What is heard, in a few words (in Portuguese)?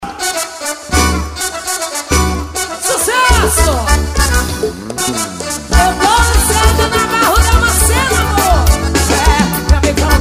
forró